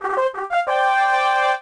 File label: Great Gen Charge; Civilization Revolution